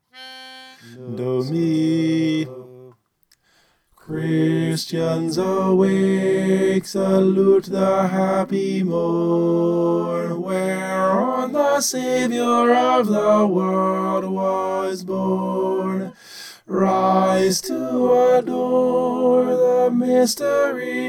christians awake tenor

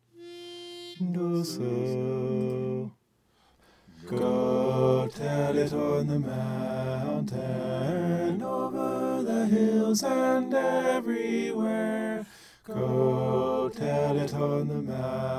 go tell it tenor

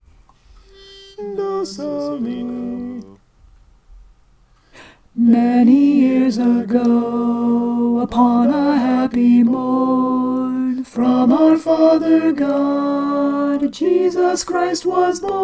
christmas j-o-y alto

